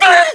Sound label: Hurt; Yuga getting hurt; Zelda